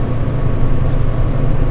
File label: pad interior; ambience; narshaddaa